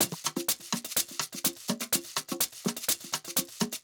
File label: pushed top, 125 Beats; PowerFX